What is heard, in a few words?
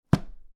Book Drop